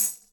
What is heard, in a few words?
tamb hit